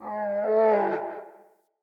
Forest Open Glade Animal Far; Day

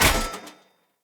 Sphere Polearm Medium Impact Metal; Player; Environment

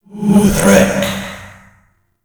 Alt Fire Whispers; Weapons; Warframe; Tenno; Grimoire

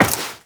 Step Metal, Footsteps, Walk; Warframe; Tenno

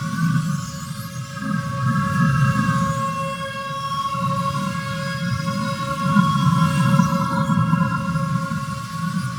Interrogation Loop; Dax

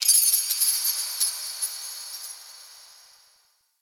Moon Chimes Small C; Ambience; Warframe; Orokin Moon

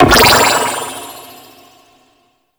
Prism Bounce; Warframe; Warframes; Harlequin

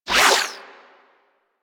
Beam Retract; Glaxion Beam Moa

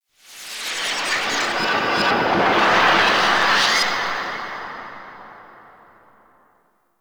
Undercroft Rev Delay Warp Space One Off; Warframe; Duviri; Ambience

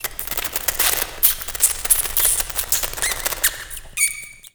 Ambience Subway Mice; Nineteen Ninety Nine

